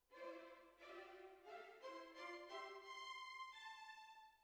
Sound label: Clarinet